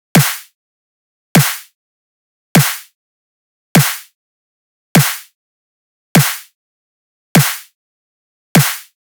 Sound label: Drum Loop 100 BPM Snare, Midtempo; Cymatics; ANALOG EVOLUTION Beta